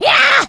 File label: rage, infected, female